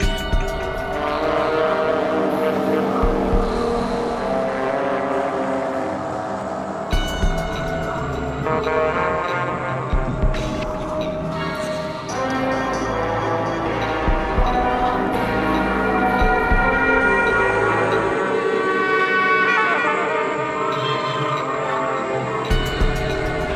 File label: Ambient Cries And Whispers